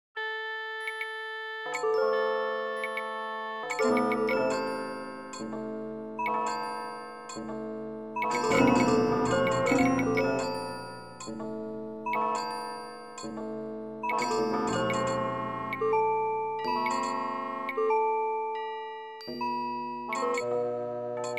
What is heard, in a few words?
heavenly chimes scale with shanai etc